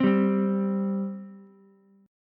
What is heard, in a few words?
C 5th C down Ukulele; C5 strummed